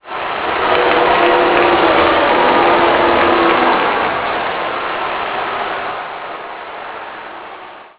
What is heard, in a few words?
crowd; XBOX; MX 2002 Featuring Ricky Carmichael